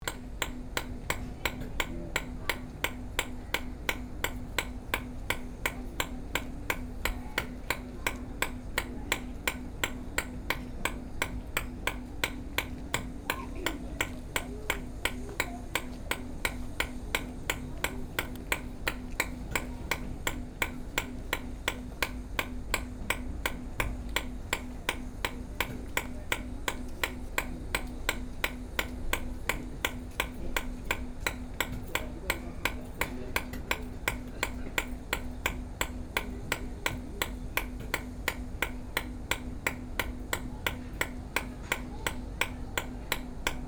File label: Class 31 click; Scene 145 Class31